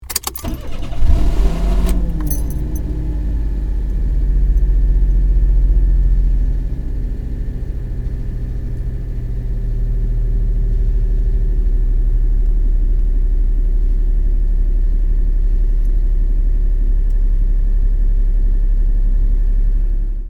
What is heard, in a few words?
kei car engine